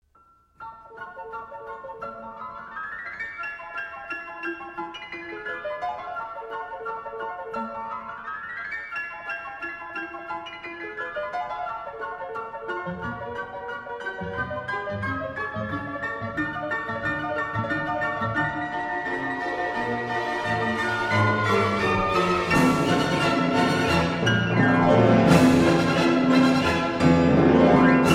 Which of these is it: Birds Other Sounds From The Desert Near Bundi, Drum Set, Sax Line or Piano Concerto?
Piano Concerto